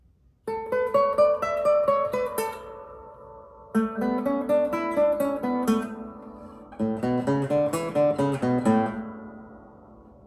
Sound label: Fingerpicking